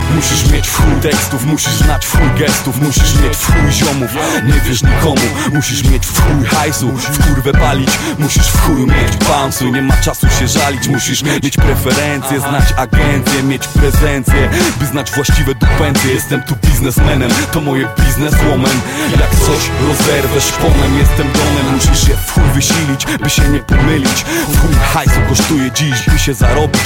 ghost ringtone; hip hop

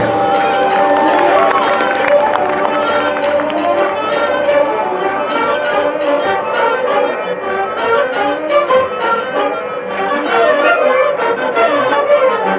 Woodwind

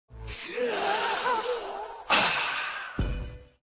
sigh breath

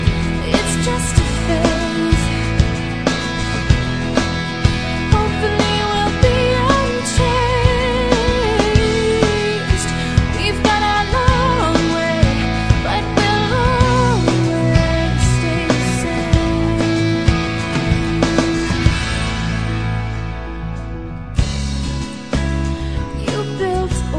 Is Calling Ska, ringtones

